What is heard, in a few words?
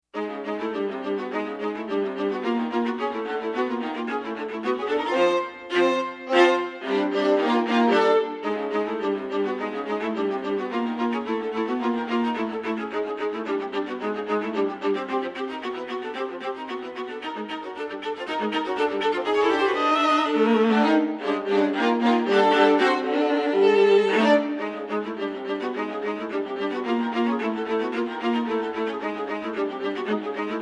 Gallop Str Duo